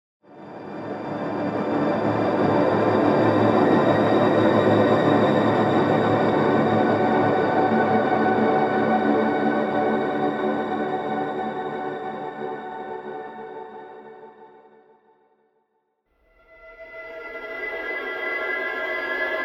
Steam Band; Albion One

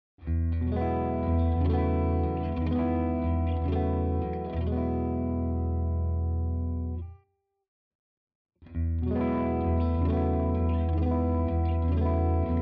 Axis Flanger clean all on 12 Depth 5 Settings

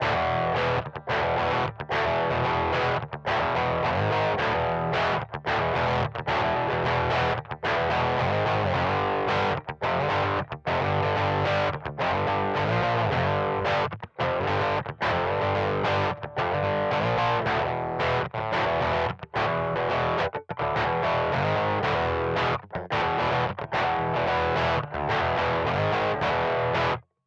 Simplifier DLX Parallel American Lead EL34 412er

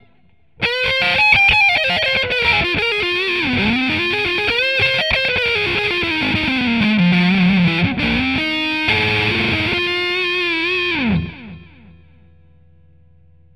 SL OD Interface

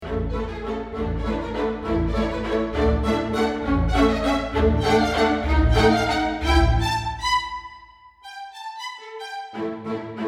Spiccato Strings Mid

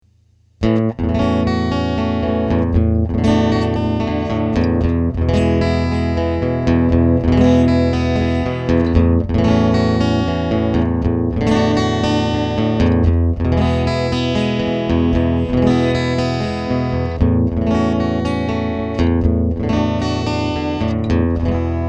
Amp1 mono

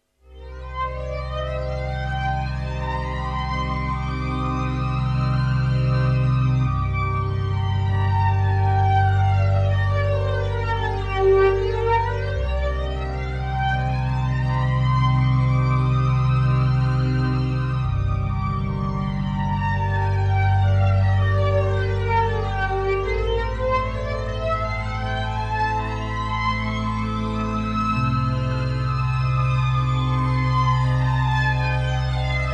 Korg Trident Strings Flanger

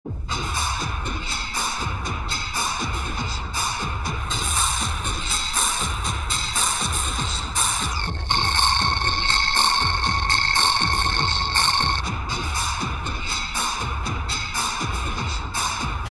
Drumloop Vocoder Morph

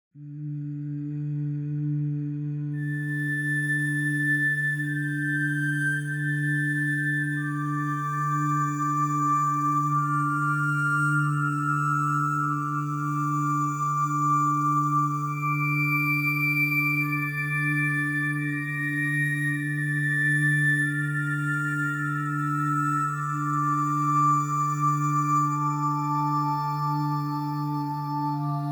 Angelic Choir

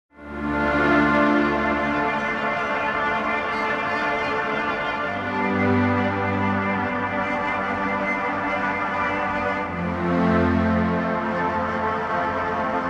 Analog Strings; Output; Fog Light

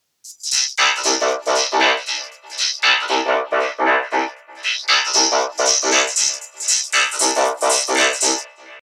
line in drumloop; micro freak

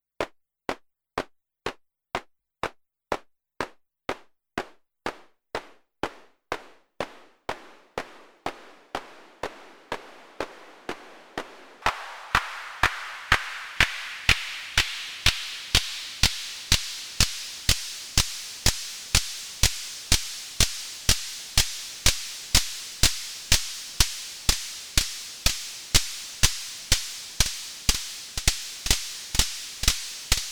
MFB Tanzbaer Claps

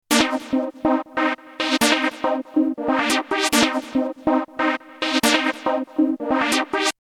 Slicer

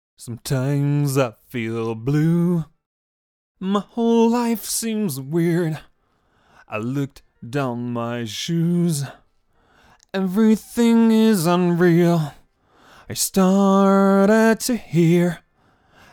Vocals DRY

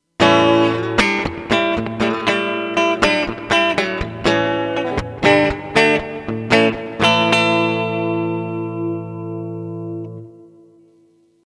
Reverb Medium; EarthQuaker Devices Ghost Echo V3; LTD

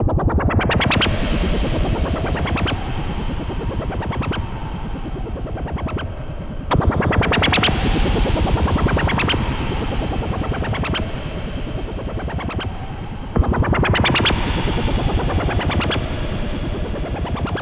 Boss SY 1 SFX

